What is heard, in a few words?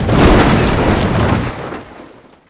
crash; Mafie 1